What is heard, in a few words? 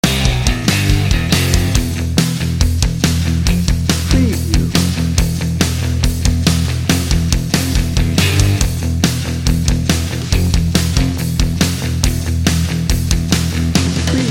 macho rock bed